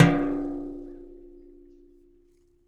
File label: hit, rust kit; Nucleus Soundlab; Ambient Drums